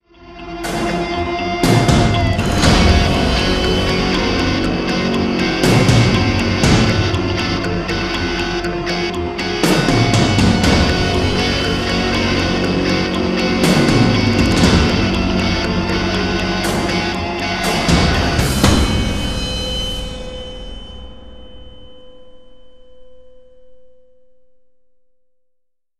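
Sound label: sting, guitar drums long; transformers war for cybertron gamerip; ACTIVATE TRYPTICON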